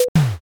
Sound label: toaster